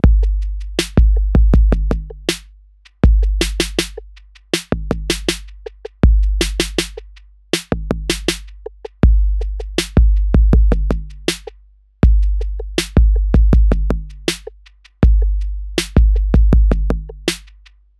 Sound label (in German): Oversampled TR808 scratchoid Leichter Druck; Pancz